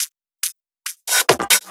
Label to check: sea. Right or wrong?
Wrong